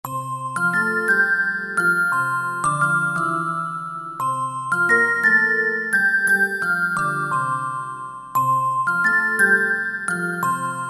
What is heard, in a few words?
melody child